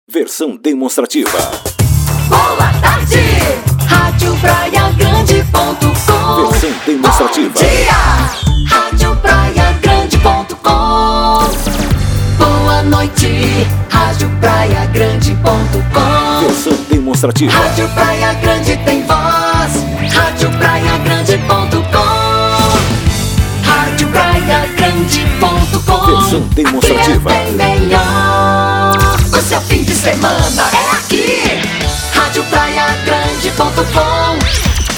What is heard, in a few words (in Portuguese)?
vinhetas radio; praia grande